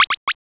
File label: Cry